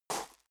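step dress boots gravel medium slide short, footsteps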